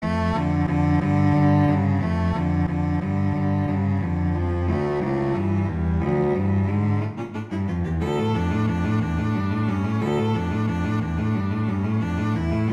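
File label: Duet, Andantino, viola; Mazas; Duets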